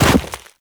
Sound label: Run Deep Snow, Footsteps; Warframe; Operator